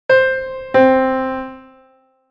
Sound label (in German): Intervalle sukzessiv